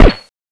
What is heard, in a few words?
m4sd fire; weapons